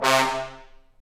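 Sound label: Trombone Section, Brass Sections; Roland LCDP06 Brass Sections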